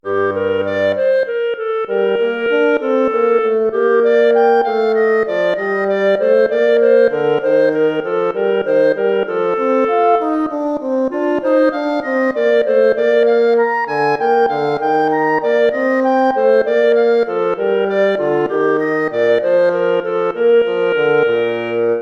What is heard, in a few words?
gigue